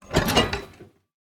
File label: copper chest open